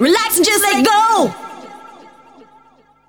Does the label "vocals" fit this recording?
Yes